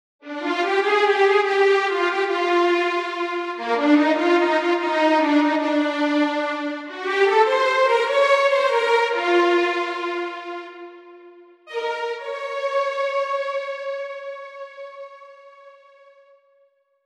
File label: VI perf legato sul G